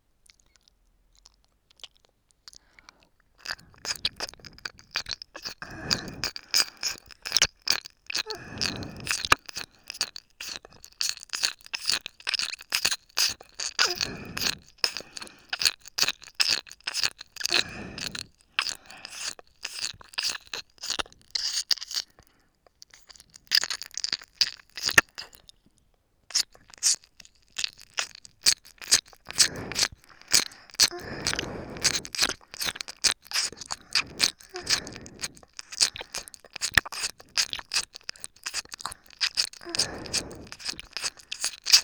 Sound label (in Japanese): 左耳深め＋吐息